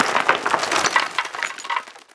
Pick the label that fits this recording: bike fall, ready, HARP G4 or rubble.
rubble